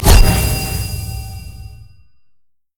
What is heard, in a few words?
summonerspell base barrier; LoL